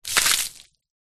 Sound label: household water from bucket throw splat concrete